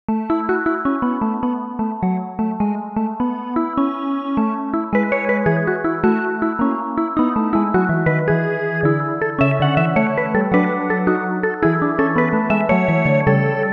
a fugue or fuguettita on the theme played on a very early version of the synth; Jackdaw